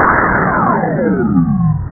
Spell Launch; Realmz 6.0.3; The Family Jewels

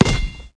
KICK; Dorling Kindersley My First Incredible Amazing Dictionary

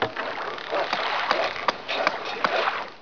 shipyard; Caesar3

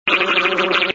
Sky Slow; moonstone madness

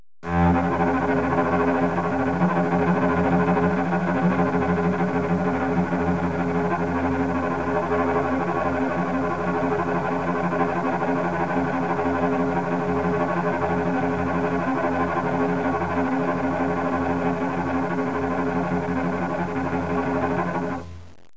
cello, 8BIT

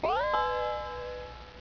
Tone

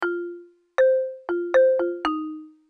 XYLO; MOBICLIC 107

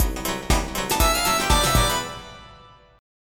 FILL, HOUSE

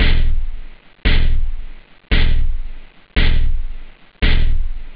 hard, drums techno; OctaMED SoundStudio V1

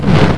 fire psionic; Odyssey Demo

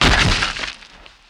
Hit Effect Stone; 1944 Battle Of The Bulge

